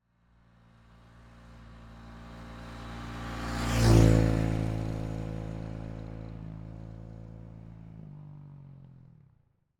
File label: Motorbike passing by distant perspective; vehicle